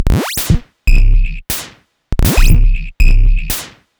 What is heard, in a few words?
Clackers, 120 BPM, Percussion; Sonic Foundry (Sony Creative Software); Underground UK House & Electro